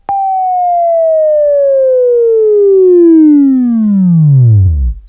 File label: Buzz, Right; Labview-Program; Sweeping Training program